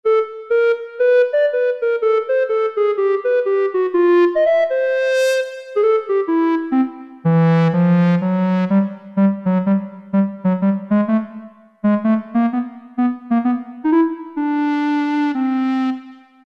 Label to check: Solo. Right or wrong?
Right